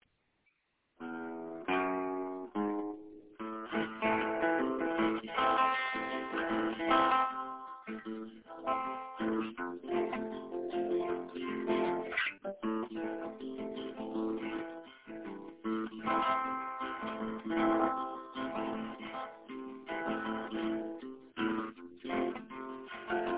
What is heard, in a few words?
wild epic song chords